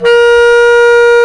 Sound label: Bs Clarinet, Orchestral Winds; Roland LCDP04 Orchestral Winds; Lo Clarinets